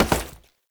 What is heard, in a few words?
Step Default, Foley; Warframe; Oraxia